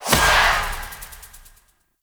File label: Dash Start; Infested; Zealoid Prelate